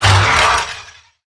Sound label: Melee Attack; Necramech; Battle Barks